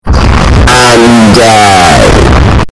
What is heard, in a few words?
loud funny